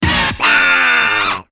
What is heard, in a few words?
Failed